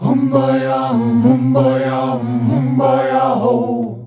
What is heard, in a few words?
VOX VOX; Sound & Vision - Gigapack II CD 2